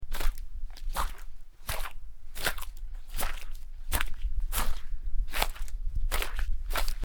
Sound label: Mud Footsteps; horror; horror sfx vol 2